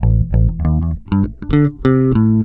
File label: LIGHT 98 A, Bass Grooves; USB Soundscan vol.30; AKAI